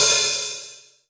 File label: dark tone open hat one shot g sharp key; drum single hits one shots; Open hi-hat one shot samples